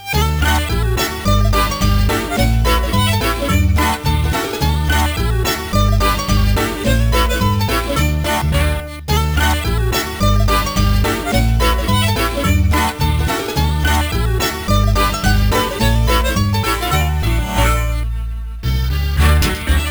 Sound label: Ukulele